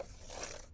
Dry Cut, paper cutter; Micro Pack

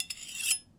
Small Knife - Scrape, kitchen knives; Micro Pack

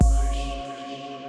Kick Hush, drums; BB 2HTC Samples Vol 4

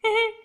cute, creature

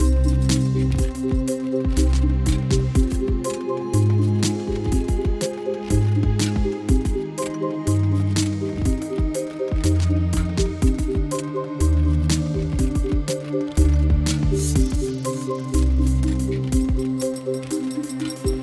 No More Energy, Loops

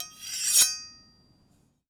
Big Knife - Scrape, kitchen knives; Micro Pack

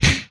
Gun, retro arcade; Guns

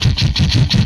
Helicopter Loop, Vehicles; retro arcade

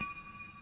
Tink, Drums; BB 2HTC Samples Vol 4

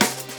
Snappy Snare, Drums; BB 2HTC Samples Vol 4

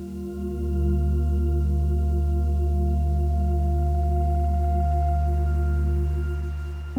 Muffled, Pads; BB 2HTC Samples Vol 4

